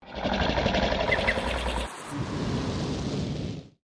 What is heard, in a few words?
gryphon breathing; SFX; DailySounds